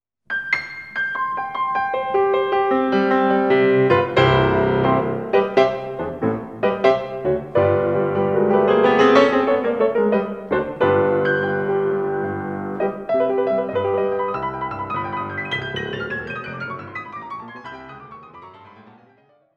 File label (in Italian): Preludi Per Pianoforte; Dieci Preludi; Preludio n.10